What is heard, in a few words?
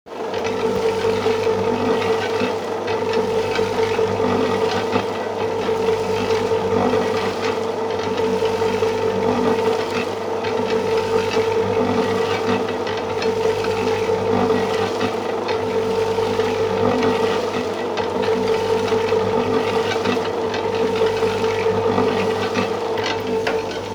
concrete mixer; building site; Let's Build! A Building Site Sound Story and Soundboard; construction